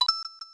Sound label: coin; smw2